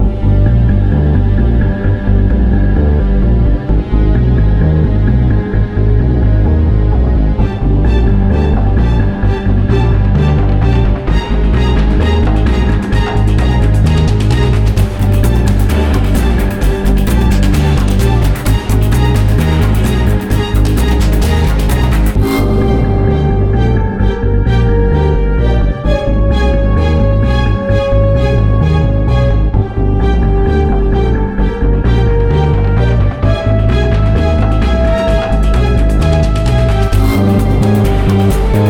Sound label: big panic; Manamon Music; Manamon 2